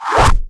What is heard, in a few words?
pause open; DinkSmallwoodHD